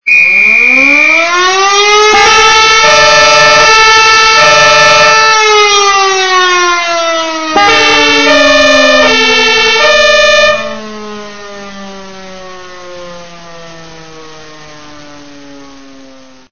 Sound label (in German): feuerwehr